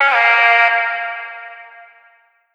Omnivox oneshot Bbm, Vocal; One Shots; Slate Digital Omnivox Vocal Sample Pack

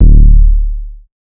808 oneshot Blow D; Slate Digital BASSHOLE Sample Pack

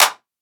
clap clean; Drum One Shots; SD MIDNIGHT Sample Pack; Slate; Claps

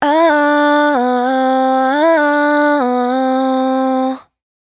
riff Am, Dry Vocals; Raw Vocals; Slate Digital Omnivox Vocal Sample Pack

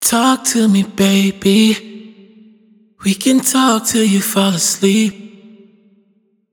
hook 138 F wet, Vocals; Talk to me; Slate Digital Omnivox Vocal Sample Pack

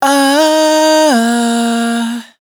riff Fm, Vocal, Dry Vocals; Raw Vocals; Slate Digital Omnivox Vocal Sample Pack

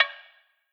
perc bump, Hip-Hop Drum One Shots; SD CAICOS Hip-Hop Sample Pack; Slate

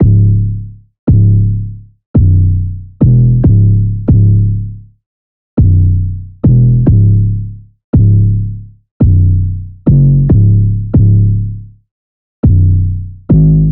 808 loop 140 C#m; Slate Digital BASSHOLE Sample Pack

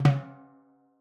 timbales Flams; Raw Perc One Shots; Slate; SD SEISMIC Drum Sample Pack

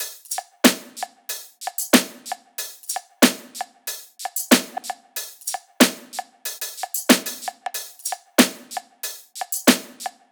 top loop, Drums, Loops, Top Loops, Pop; Slate; SD PASADENA Pop Sample Pack

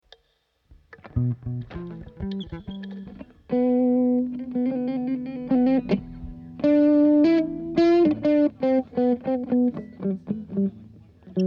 Strings

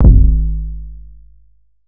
808 one shot Splat Ab; Slate Digital BASSHOLE Sample Pack

